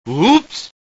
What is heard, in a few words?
button